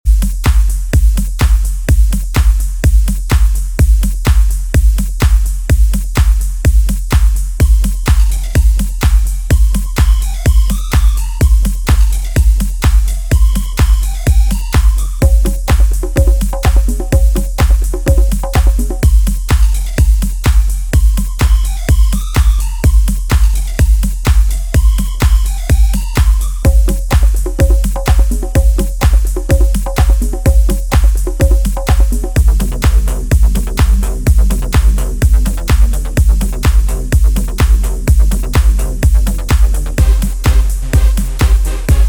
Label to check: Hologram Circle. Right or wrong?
Wrong